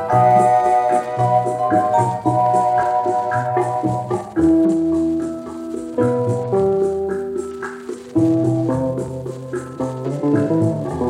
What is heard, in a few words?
Iphone original notification sound; Angel's Lullaby